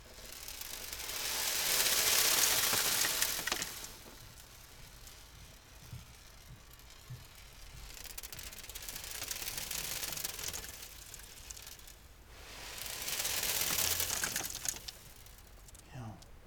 Sand Slide On Metal

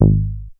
Bass

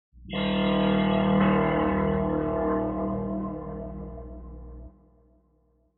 Guitar Swell

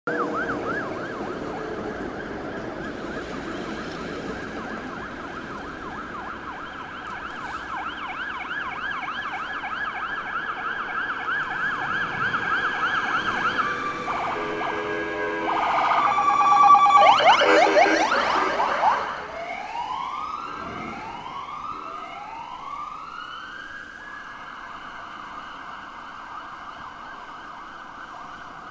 Ambulance

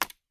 Breaking Small Stick

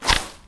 Bush Cut

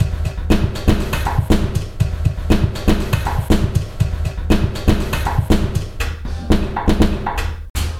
Binaural Beat

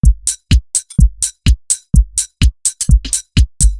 Talk Box

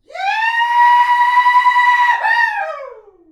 Yahoo

